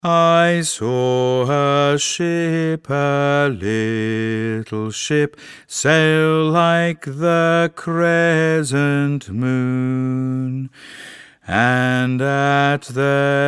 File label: bass